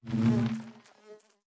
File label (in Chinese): 蜂箱工作声